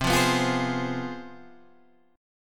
C Major 7th Suspended 4th Sharp 5th C down Guitar Half Step Down